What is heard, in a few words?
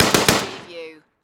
Machine Gun STG44